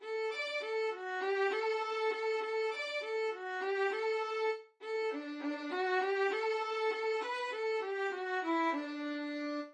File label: violin